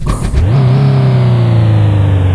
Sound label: train use